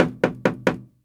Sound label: KNOCK; 1000fx